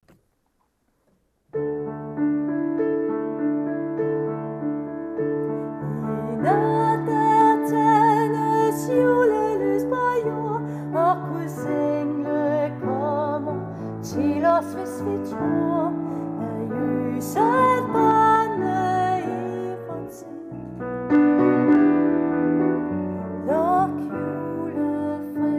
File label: Luk julefreden ind sopran